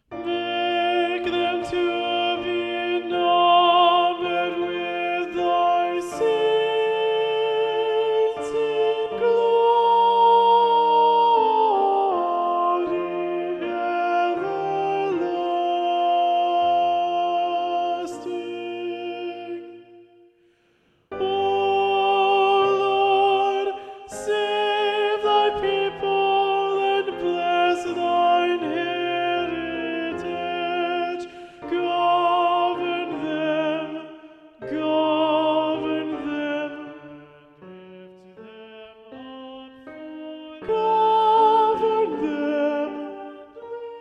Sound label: Make them to be numbered Alto; Handel; Te Deum